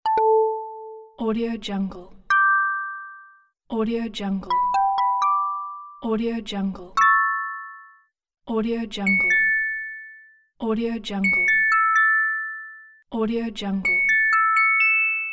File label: light and bright sound pack